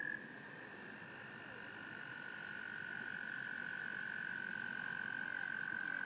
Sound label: Toads and birds singing